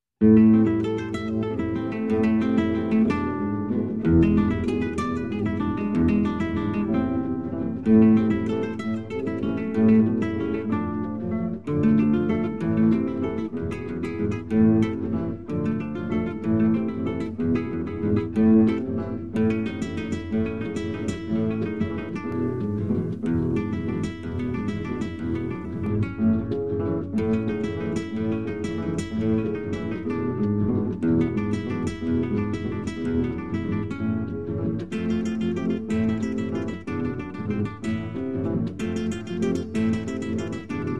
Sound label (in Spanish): canto del viento, flauta guitarra y arpa de los andes